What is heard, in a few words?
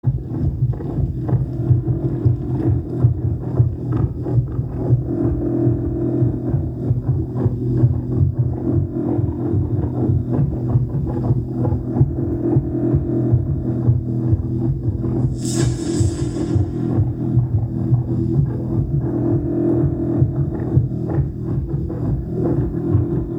Alien amb